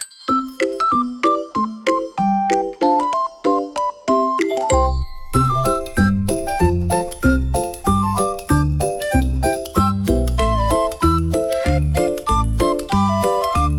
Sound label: march